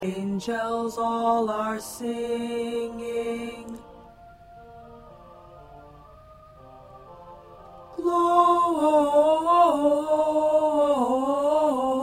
Tenor; christmas songs for choir; Tenor part preview

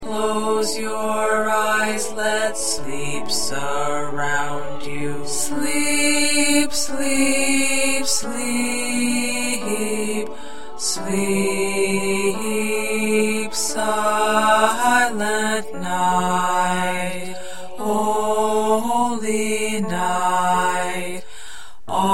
Baritone, choir parts

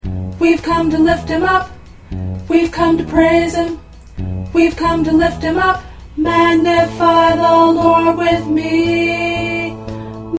Tenor

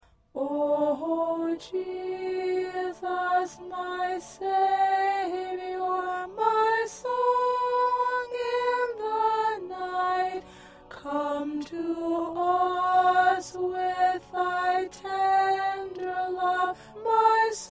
Soprano

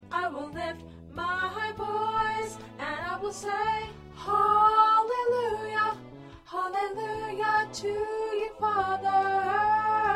Soprano

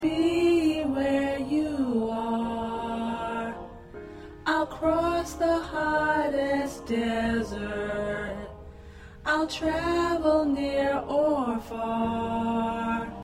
SATB Alto

